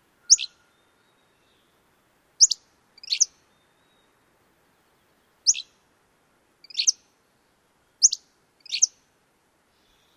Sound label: song; CA